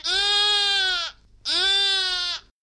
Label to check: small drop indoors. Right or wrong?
Wrong